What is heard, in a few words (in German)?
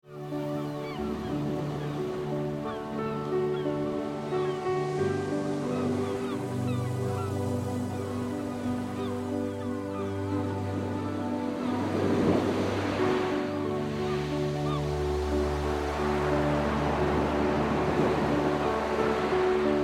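möwe sound; Phantasiereise; calm child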